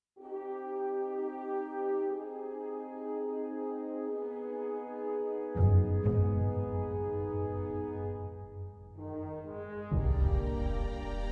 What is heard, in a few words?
String